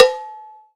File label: Cowbell; osu